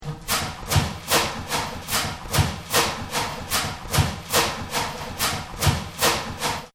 Flachsverarbeitung Brechen; hessenpark

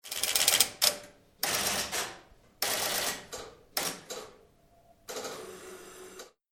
Telefonvermittlungsanlage; Posthaus

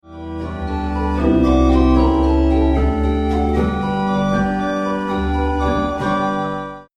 Kapelle Orgel; aus Lollar

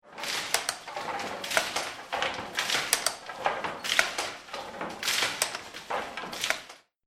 Webstuhl

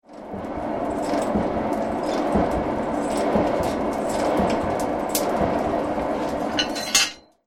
Schmiede Blasebalg Feuer lang

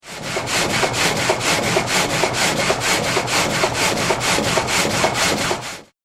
Sägewerk Horizontalgatter

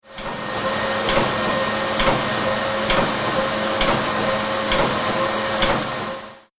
Druckerei Klischograph

